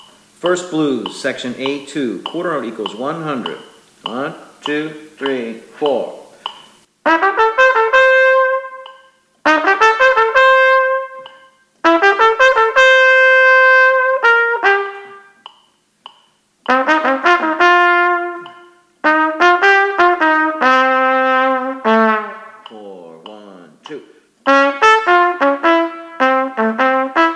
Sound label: Play-a-long First Blues with click, qn=100, trumpet; section A2; 12 Medium-Easy Etudes